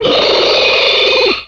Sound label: aspain, monsters aliens